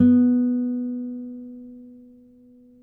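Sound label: nylon, FLAMENCO, Guitars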